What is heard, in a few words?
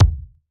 Ball Collision Geo Tackleball Hard